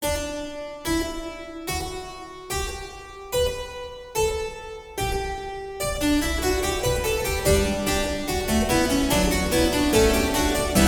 Contrapunctus VII a 4 per augmentationem et diminutionem, String Quartet